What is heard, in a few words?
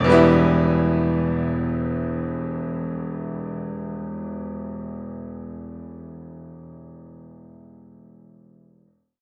Atmo Em; Vengeance Samples; VTS1 Bonus Sounds